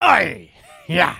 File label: Shoot Complete; creatures organic